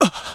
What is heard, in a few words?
injure male; character